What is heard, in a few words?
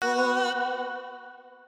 click